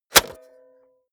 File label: cassette player slide tray insert hard